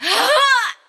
female orgasm

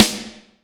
lemony, snares real

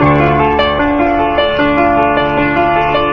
piano, Sad

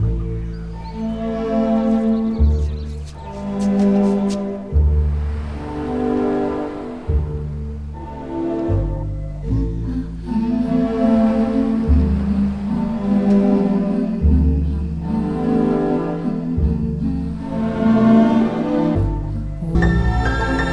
strings, Loops, Sad

